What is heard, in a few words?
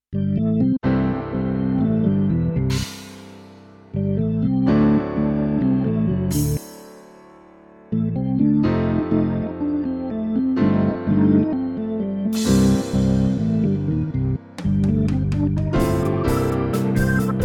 hamm piano